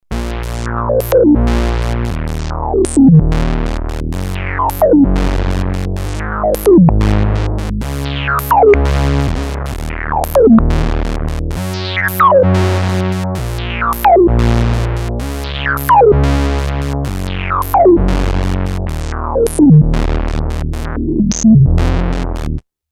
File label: synth filter